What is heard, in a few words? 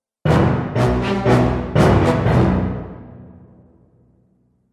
Action Film Dramatic Event, musical